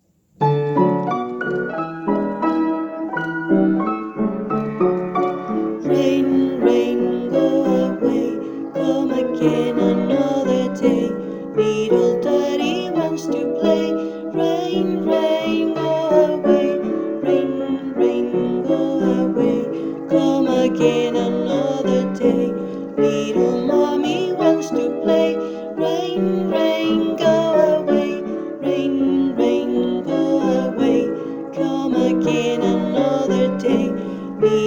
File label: Rain rain go away piano y voz